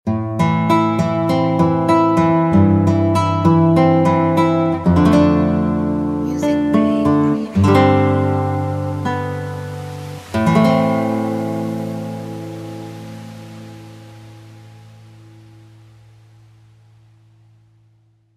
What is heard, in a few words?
Melancholic Guitar Logo